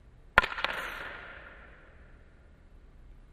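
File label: Hockey Medium Impact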